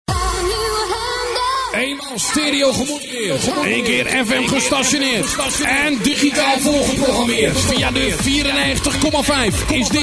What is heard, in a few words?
Jingle; Bladhark 94.50